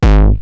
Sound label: Roland TR-909 BD; BD Selection